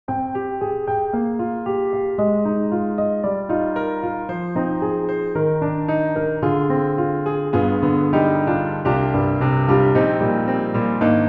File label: classical piano; EastWest; Quantum Leap Goliath